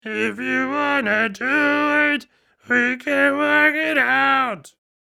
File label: growl; CVT